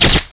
flash screen

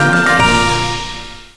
upgrade unlocked; ttt

